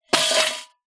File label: foley money collection tin coins inside drop; zapsplat